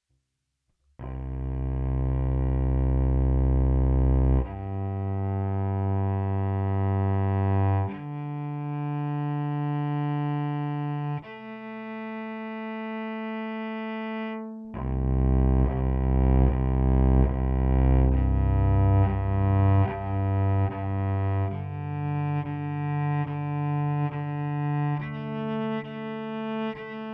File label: pickup direct